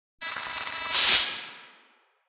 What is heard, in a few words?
short curcuit; CHica; Five Nights at Ronald's